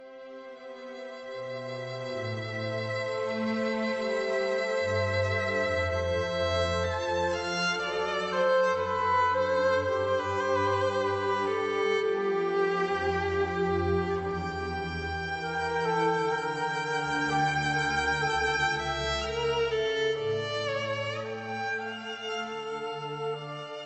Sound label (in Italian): Aria sulla quarta corda